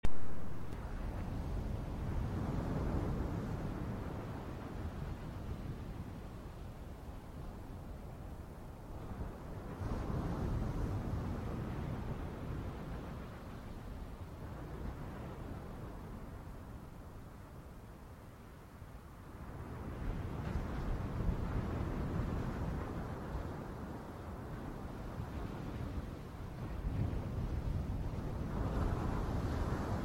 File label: ocean piano